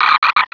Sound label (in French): Cri